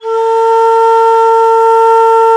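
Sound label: alto bansuri, World Winds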